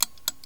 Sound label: Tick Tock